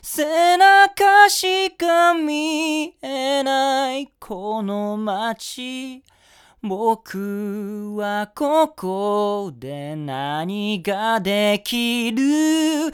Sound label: NT1 Behringer